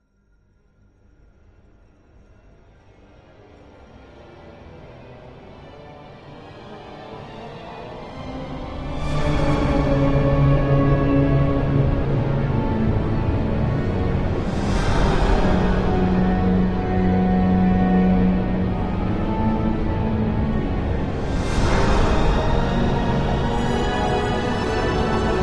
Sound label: Music Eerie Orchest; orchestral